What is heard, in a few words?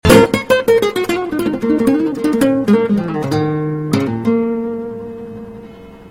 Mexican Guitar SMS